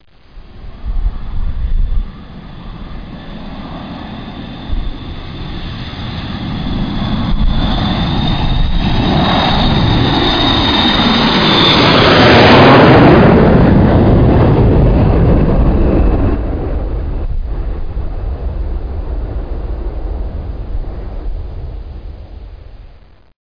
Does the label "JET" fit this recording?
Yes